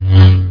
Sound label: Lightsaber Swing